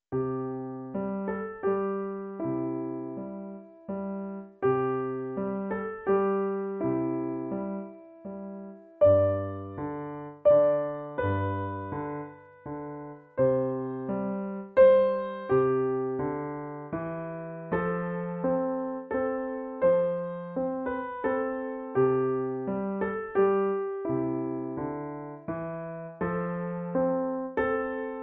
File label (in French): Douce nuit, piano